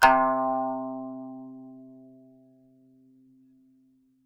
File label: KANUN C3; SoundBytes; Early Patches; KAN SING BIG